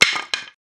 wood falling; wood metal SFX

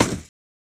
hit; breaking falling hit sfx

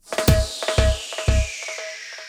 Drum Fill; Drums; BB 2HTC Samples Vol 4

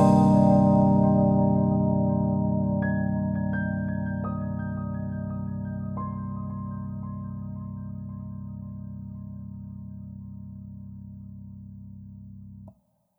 Bending in the Air - Floating Away, Pads; BB 2HTC Samples Vol 4

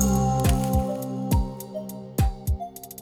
Crackly, Drums; BB 2HTC Samples Vol 4

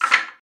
wood misc, wood metal; wood metal SFX

